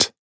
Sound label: Percussive